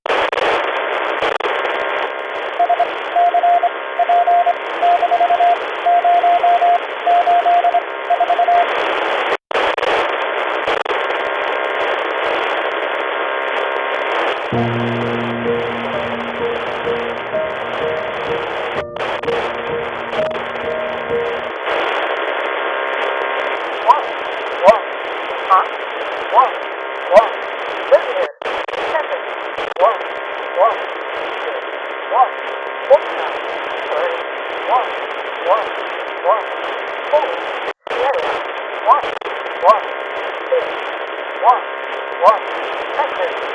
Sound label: static radio